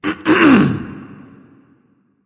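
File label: nonverbal clear throat; smite; voicepack; ullr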